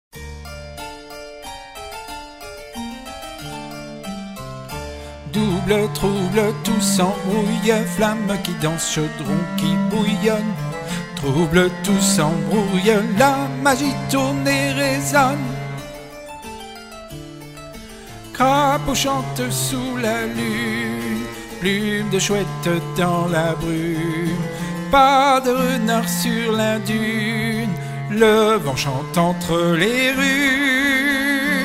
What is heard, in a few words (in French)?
Voix 3